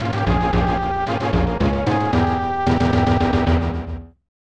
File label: Player Down; DK Country 2